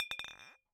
Bottle Struck

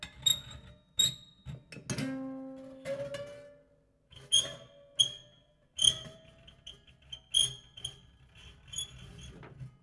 Plier Squeak, Piano Destruction

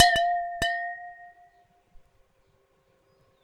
Water Clink, Glass Clink In Water

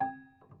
Prepared Piano

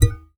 Classical Guitar Extended Technique

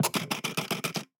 Camera Sequence

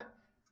Ping, Kitchen Toaster

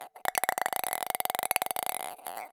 Ping Pong Rattle, Large Wine Glass

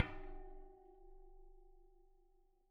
Bicycle Spoke

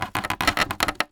Polystyrene Creak

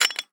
Hole Saw Scrape; Hole Saw Bits

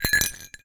Cowbell Chain, Percussion

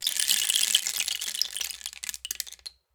Rain Stick, Percussion Samples

